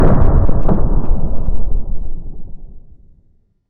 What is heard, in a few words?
Huge explosion from bunker; Hovl Studio; SFX Battle sounds; WhiteMan Unity2D